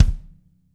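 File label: bass drum, Drum 1 Dry; East Collexion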